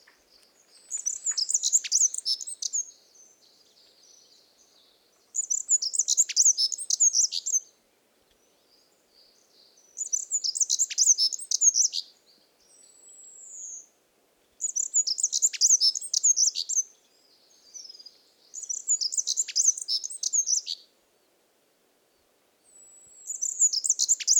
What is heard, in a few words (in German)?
schwarzkehlbraunelle